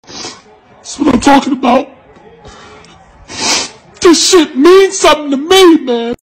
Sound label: old man crying